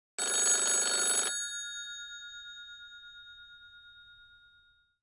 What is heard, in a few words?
telephone ring; Film Noir; SoundPad